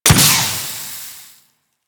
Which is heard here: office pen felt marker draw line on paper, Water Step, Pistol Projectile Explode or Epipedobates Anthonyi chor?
Pistol Projectile Explode